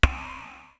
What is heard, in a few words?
Korg Z1 Snare